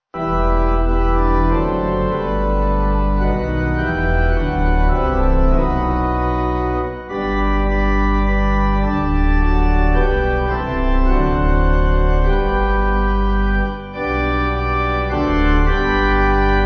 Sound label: Uffingham Pipe; Creator Of The Earth